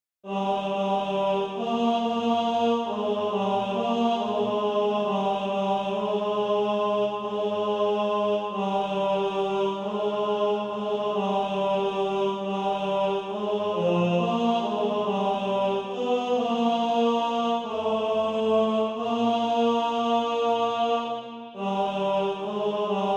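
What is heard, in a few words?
tenor, SATB Practice Music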